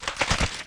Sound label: freeze Horror; walkingDread